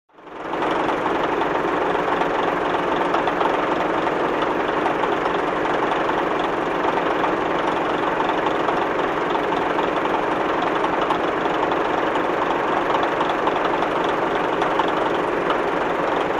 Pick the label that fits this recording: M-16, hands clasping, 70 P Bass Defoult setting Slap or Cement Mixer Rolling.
Cement Mixer Rolling